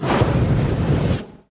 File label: tower dwarven Flamespitter basic Attack cast